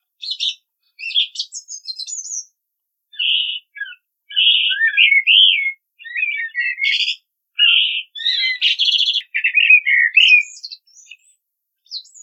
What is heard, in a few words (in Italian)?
tordi al capanno